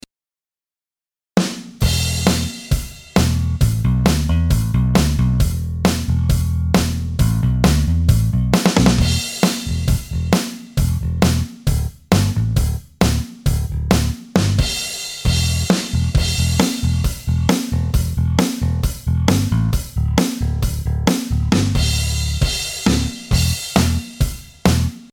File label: Spector Bass